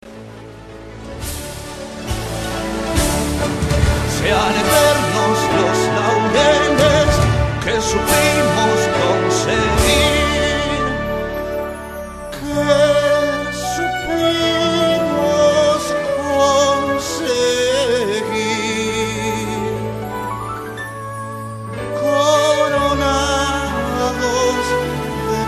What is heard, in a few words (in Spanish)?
HIMNO NACIONAL ARGENTINO